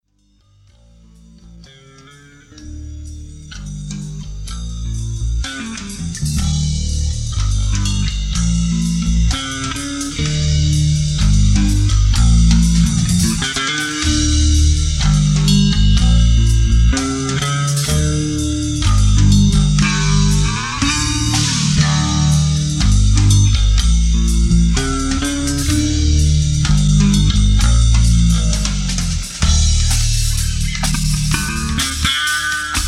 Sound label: Bass & Drums noise